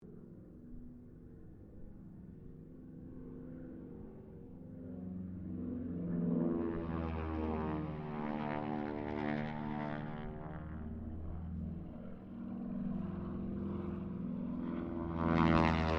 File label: Harvard squadron 4 pass, stereo; Mixed aircraft squadrons; Airplanes; Vehicles